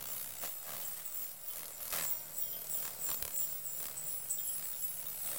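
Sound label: spin loop, slot machine; wingsofriches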